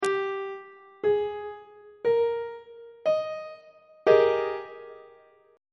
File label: g sharp major 7th suspended 2nd 3rd inversion on treble clef